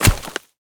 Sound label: wood tree impact bullet; physics